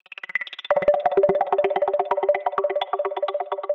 Peeblez, techno drum fx; Single Hits

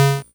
GB Synth C#; SYNTHS; CHIPSHOP DELUXE; CHIPSHOP HITS

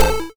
YM Arp E; chip hits; arps; CHIPSHOP DELUXE

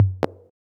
Tom, techno drum

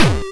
YM Kick, DRUMS

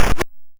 YM Perc, DRUMS; ChipShop Deluxe; ChipShop Hits

